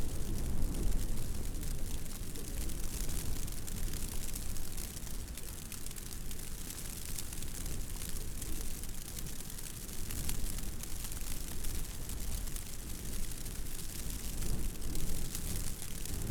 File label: snow frost; calendaria